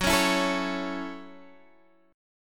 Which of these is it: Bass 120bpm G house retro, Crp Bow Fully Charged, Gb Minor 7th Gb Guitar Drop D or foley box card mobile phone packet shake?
Gb Minor 7th Gb Guitar Drop D